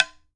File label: OKAWA STIC, JAPAN PERC; AKAI S6000 CD-ROM; WorldSounds